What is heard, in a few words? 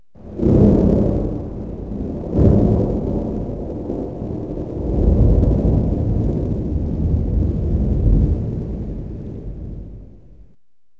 Thunder